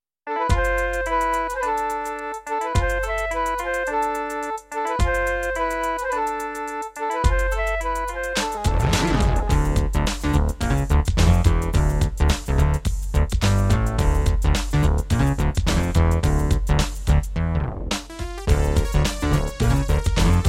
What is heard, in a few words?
Egypt, Kawai GMega; Lost Vikings